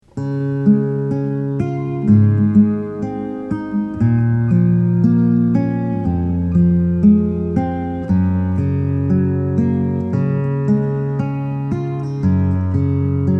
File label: Cannon in D